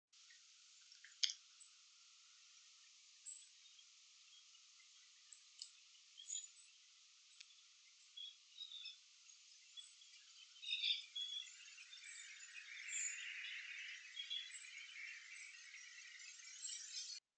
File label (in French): Colibri falle vert Eulampis holosericeus Green throated carib